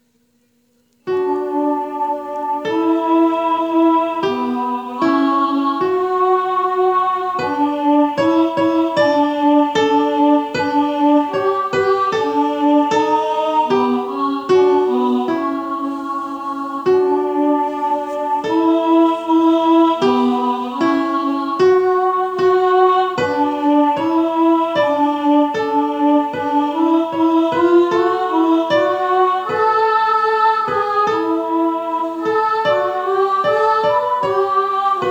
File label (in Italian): voce contralti